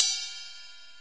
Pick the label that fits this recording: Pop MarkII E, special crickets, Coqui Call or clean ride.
clean ride